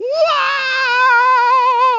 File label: falling sfx